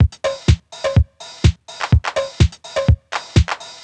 drum past full 125, Drum Loops, Classic Chicago House